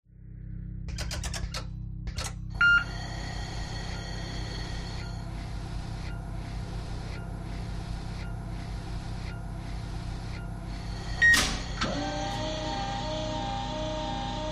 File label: sci-fi ambient